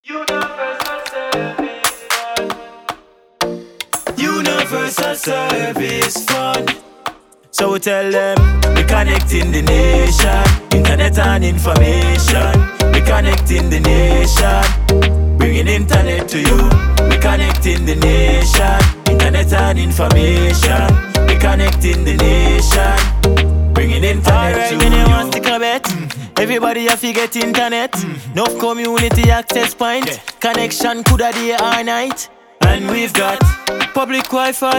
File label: jingle; USF